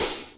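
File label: open hi-hat Acoustic Chorus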